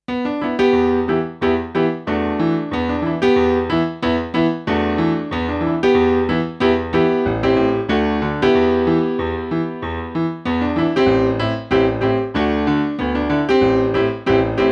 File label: grand piano